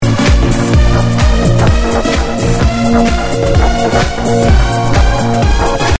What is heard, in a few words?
Techno